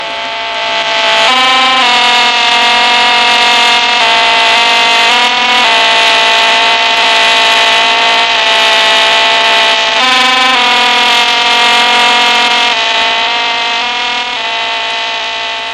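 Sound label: injun 3, satellite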